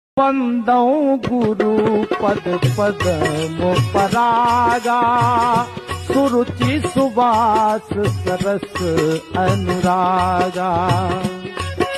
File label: Ringtone